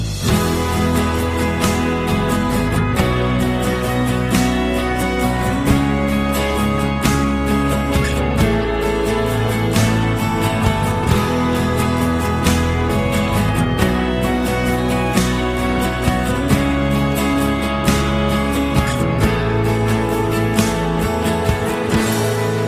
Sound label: Instrumental Song Ringtone